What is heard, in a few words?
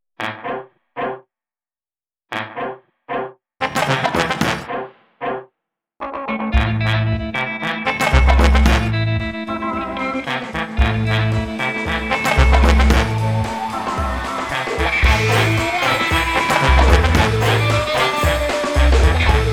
Fanfare